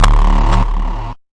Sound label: intense echo bass; Basses